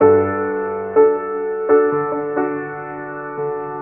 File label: piano, Loops Sad